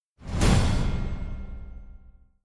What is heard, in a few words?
SFX Bonus Summary, SLOTS; Wealth Gods